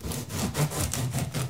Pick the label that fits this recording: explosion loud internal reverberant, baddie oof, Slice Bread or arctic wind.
Slice Bread